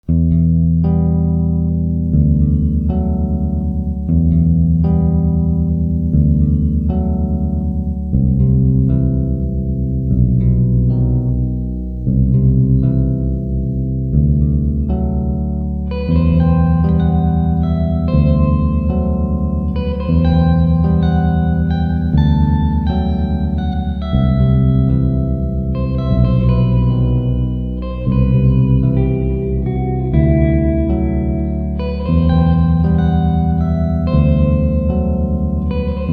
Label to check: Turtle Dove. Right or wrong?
Wrong